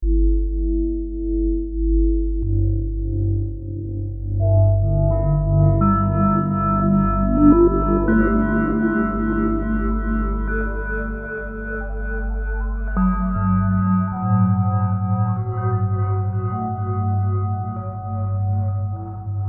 Bass Bell

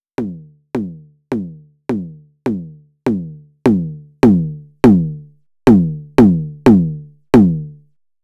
Elektron Digitakt Overdrive

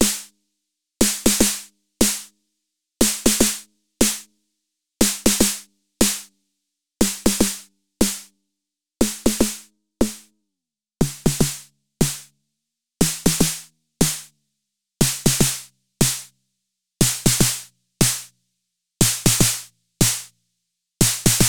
Snare

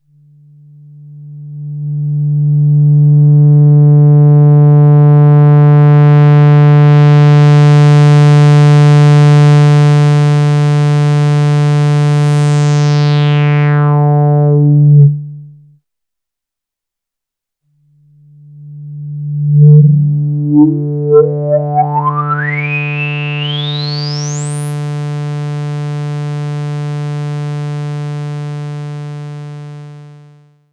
LPF

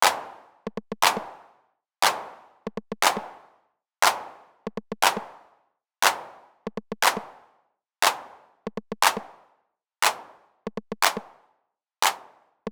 Clap Rim

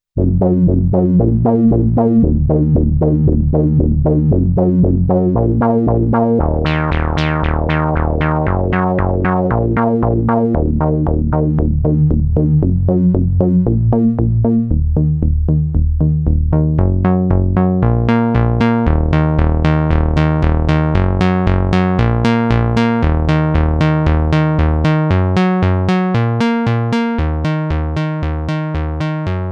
Disco Bass

